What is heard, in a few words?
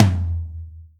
drum hit clap